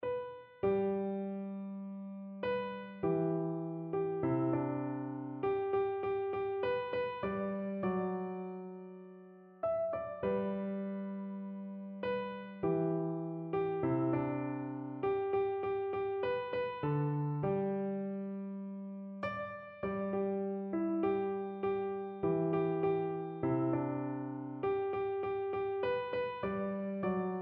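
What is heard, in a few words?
swing low, electric piano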